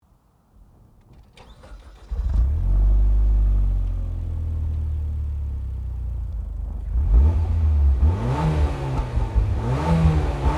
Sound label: Mitsubishi Lancer Evo MR